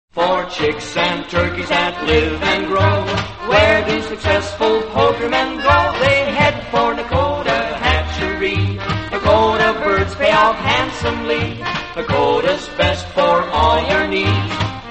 radio jingles; nakota hatchery; allied radio artists

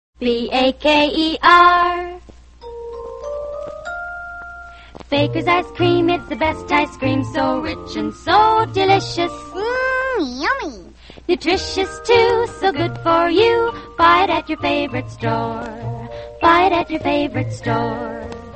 radio jingles; bakers ice cream; allied radio artists

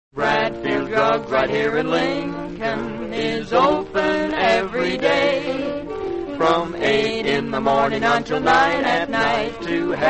radio jingles; bradfield drug; allied radio artists

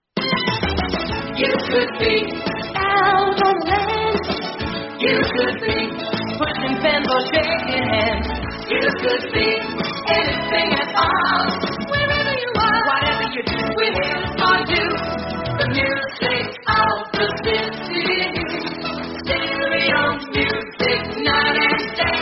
jingle; australian radio 3ba

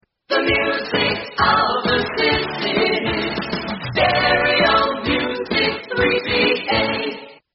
jingle; australian radio 3ba

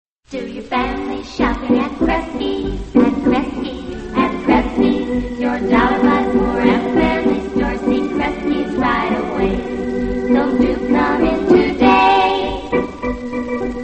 radio jingles; kresges; allied radio artists

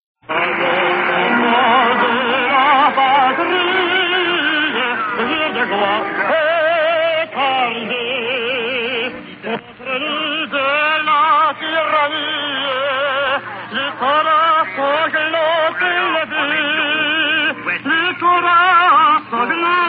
last marseillaise broadcast as paris falls; 1940

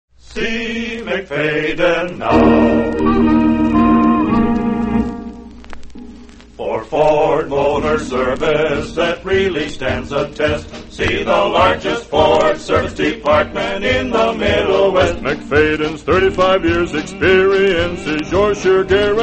radio jingles; mcfayden; allied radio artists

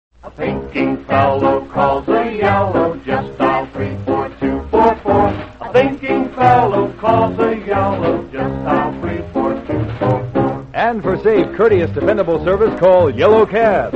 radio jingles; yellow cab; allied radio artists

